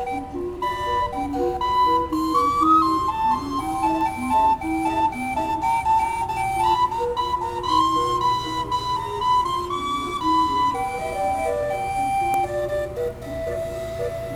Calliope Organ New Orleans USA

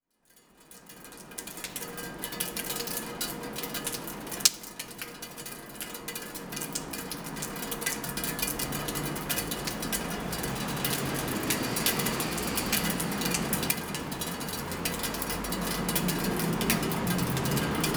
Rain On Steps; Drury Lane Newcastle upon Tyne UK

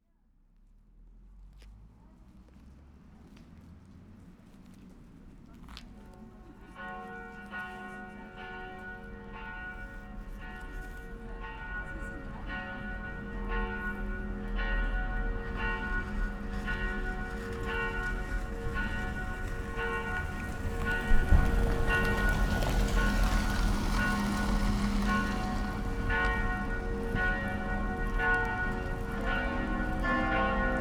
Bells of St Marks Church Zargreb Croatia

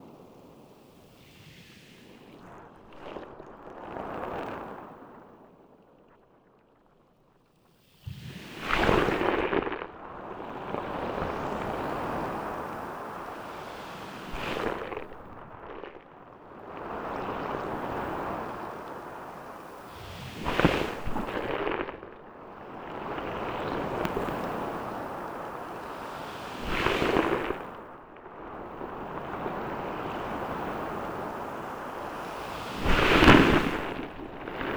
Underwater at Platja del Forum Barcelona Spain

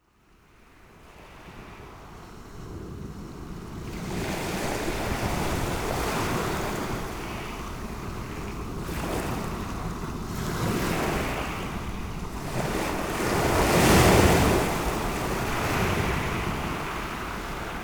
Waves at Blast Beach Seaham, UK

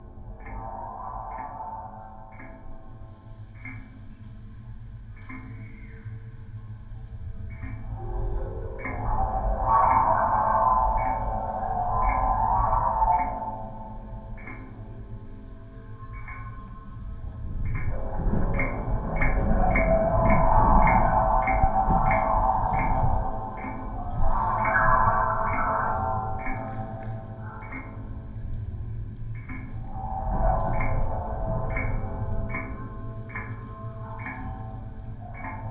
Amplified Anti Bird Wire Soho London

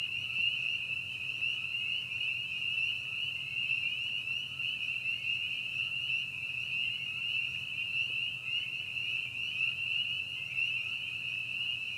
Frogs in Algonquin Park Canada

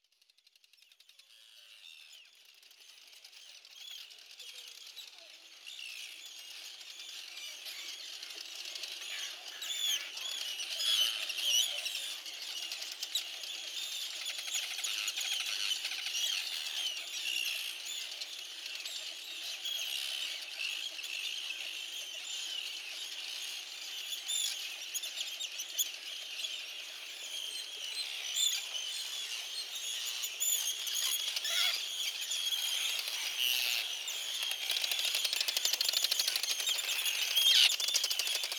Arctic Terns on Farne Islands Northumberland UK

